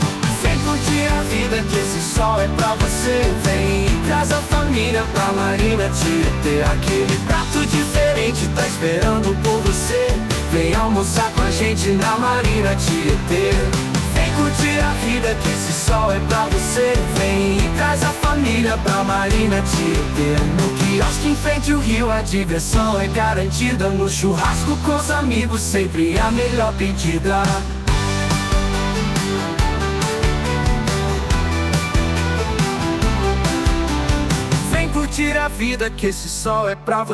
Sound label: Jingle; Marina Tiete